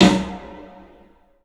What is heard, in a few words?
PA DEEP L, CLUB SN VRB; 3D Sound; 3D Drums